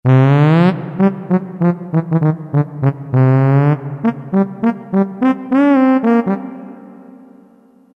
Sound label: Trombone